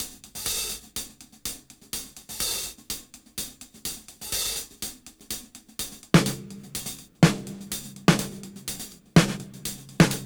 Disk 125 BPM Hat SD; AMG - Keith LeBlanc Phat Butt Jams

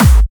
Clubby Kicks, Bassdrums

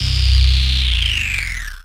RAVE, RAVESYN

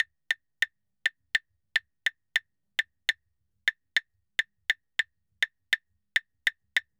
Claves 136; Best Service; Festa Latina VOL-1; Drums & Percussion

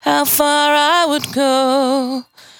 92bpm Bb, Velvet Vocal; Zero-G

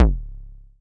kicks; FutureRetro FR777

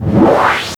Whoosh OS; Sonic Foundry (Sony Creative Software); Rondo Brothers Trip-Hop Loops & Samples

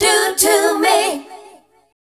FEMALE CHOIR, Dance Vocals; Best Service ProSamples vol.12; AKAI

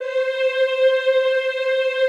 EEH C4, Extended Classical Choir; Best Service

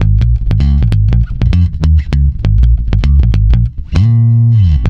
A#, swing mute, bass; Spectrasonics; Bass Legends

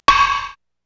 WBL, Korg Electribe EMX-1

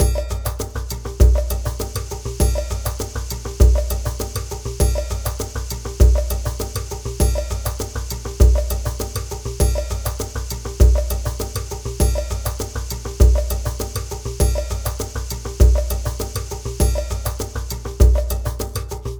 Jungle Ceremony, percussion; Sonic Foundry (Sony Creative Software); Joe Vitale Latin Percussion

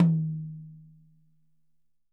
YAM 8X10, Drums; Big Fish Audio; Ross Garfield Drums VOL-2

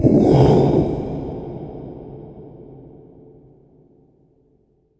IMP WHOOSH; Cinematic Impact

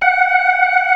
ORGAN, C3 NO PERC; Keith Emerson Signature Series

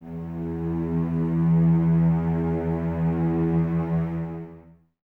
cellos E 2, String Ensembles; Miroslav Vitous

